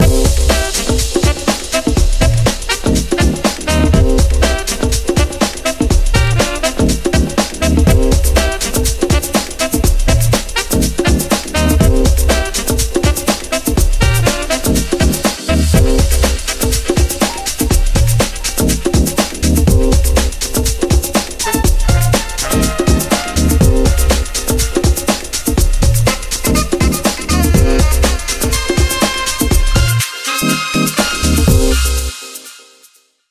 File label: Drum Roll Music